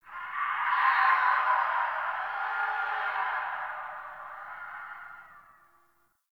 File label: Distant Infested Screams Winter E; Warframe; Ambience; NineteenNinetyNine